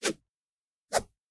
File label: new wheel wheel and text appear; golden wheel; City Verse Tycoon